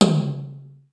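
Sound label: high long tom; sr16 tom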